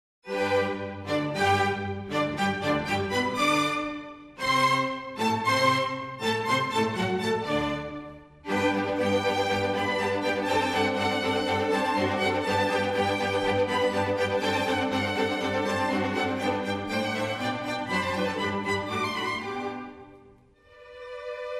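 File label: filarmoniceskij orkestr, philharmonic orchestra; slovackij; libor pesek; mocart malen kaa nocnaa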